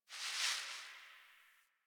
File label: Garbage Plastic Bag Rustle; Warframe; Ambience; Nineteen Ninety Nine